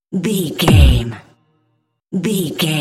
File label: cinematic stab hit trailer